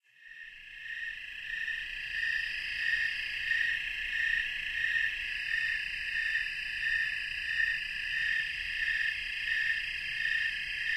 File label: Snowy Tree Cricket chorus, Songs of Insects